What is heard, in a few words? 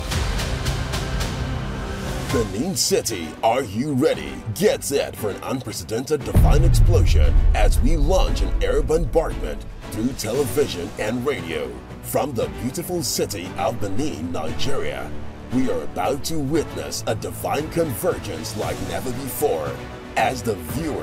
JINGLE